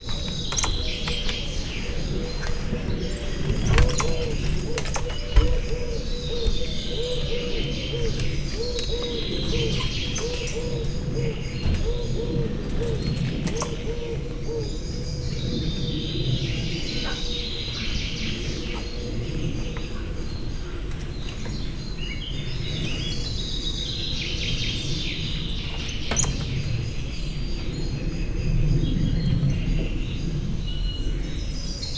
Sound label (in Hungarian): magas csipogó hang, goeldi tamarin; szegedi zoo 2011